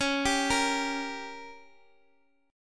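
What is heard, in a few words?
G Diminished, D flat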